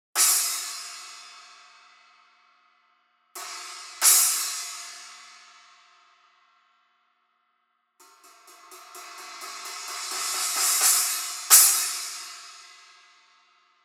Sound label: Resonant Crash 12 Savage; Masterwork; Masterwork 12 Resonant Savage Crash sample